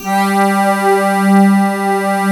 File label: SAD ACCRD; TRISTESSE